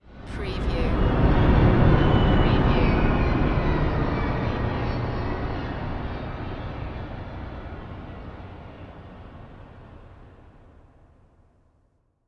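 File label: Production Element Swell Eerie; Sound Effects Bible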